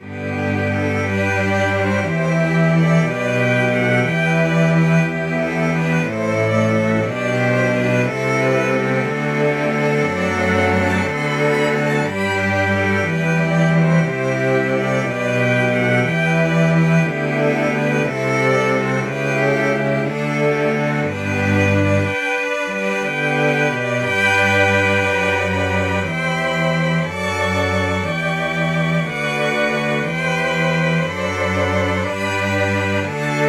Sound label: cello